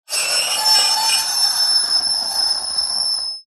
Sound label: metal scrape on metal med harsh shrill